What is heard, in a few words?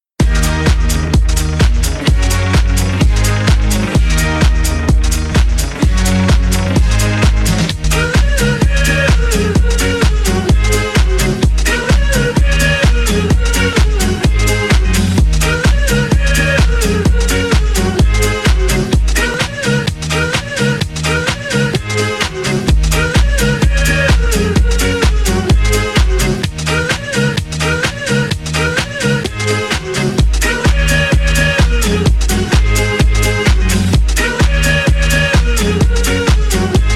Goal Song